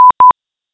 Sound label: Destination Beacon